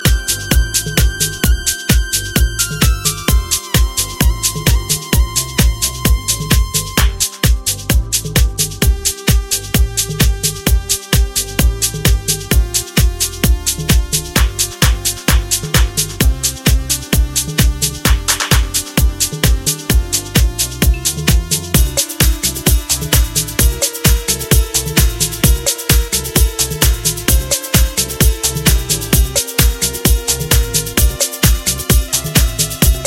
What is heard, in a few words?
Inst